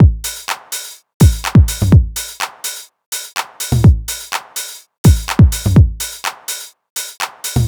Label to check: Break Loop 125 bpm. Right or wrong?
Right